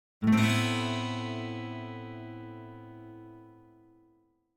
Acoustic Guitar - Minor Chord - Thin Septa Chord (7); Hollywood Edge; sound designer's toolkit v2